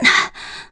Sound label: Breathe; Link breathing (low health